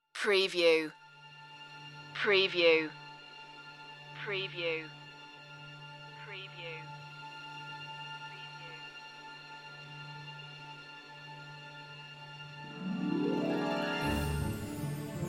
no solo voice and no choir

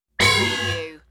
Alarm Hit

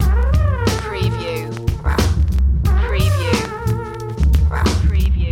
this is not jazz loop b

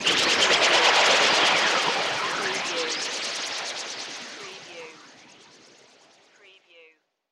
Magical Sweeper Swirl Flange

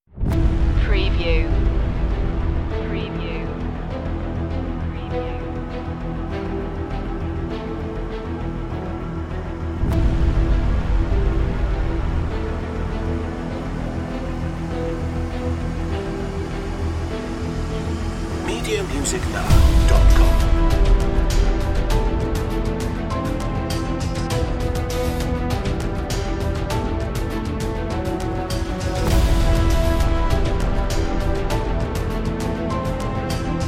building drama

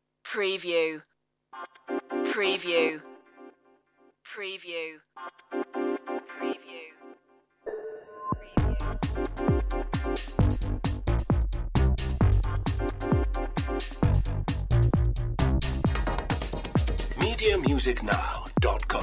electro mash

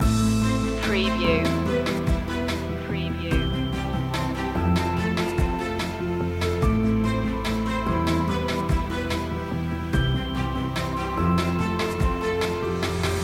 reaching a goal loop

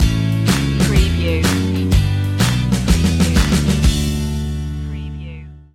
stinger

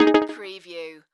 Multimedia Chime; sound-effects-bible

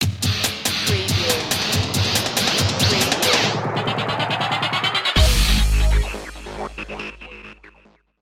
un-fairground stinger

